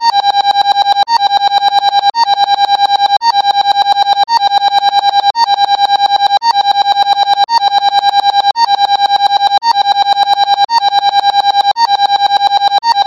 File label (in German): Digital, Alarmtöne